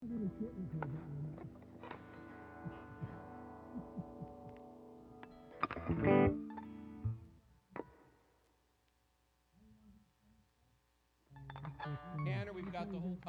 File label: Strings Emphasis Mix